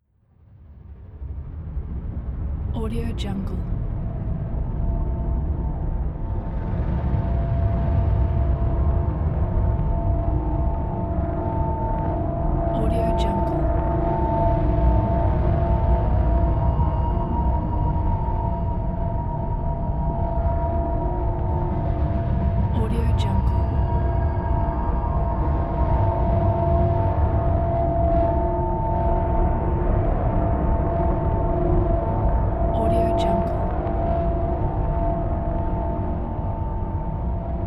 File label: suspenseful hum drone